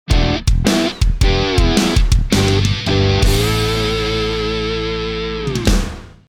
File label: Electric Swamp Sting